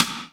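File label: snare drum, Drum & Bass, 140 BPM; Ueberschall; Drum & Bass Resonance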